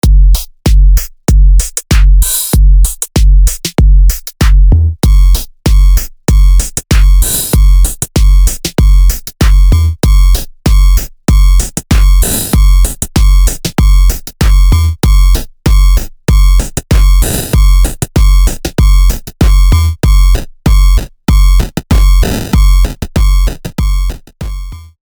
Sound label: bitcrush